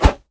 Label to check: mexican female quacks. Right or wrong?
Wrong